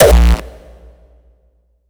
Kick funny rawstyle

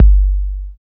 808 KICK; East West - Phat & Phunky

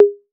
TOMS 808; Wizoo - Platinum 24 Electronic; CHR TOMS 808

